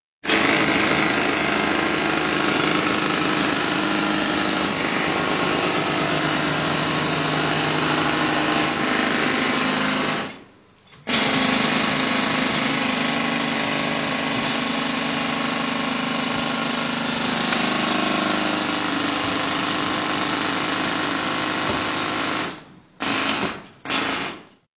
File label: Beeps; 헐리웃특수효과음; 신호-경적